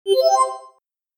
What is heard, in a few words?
positive arp